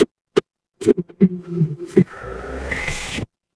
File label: mechanical engine